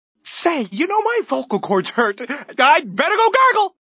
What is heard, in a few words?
gargle; Say, you know my vocal cords hurt. I'd better go gargle!